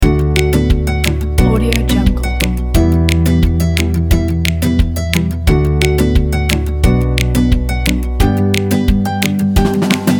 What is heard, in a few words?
positive and happy